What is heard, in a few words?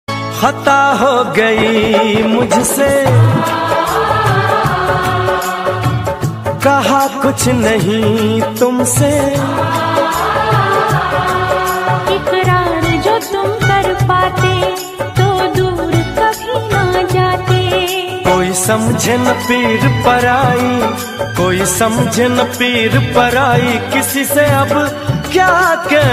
hindi ringtone